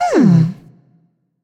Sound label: thinking; smite; tiamat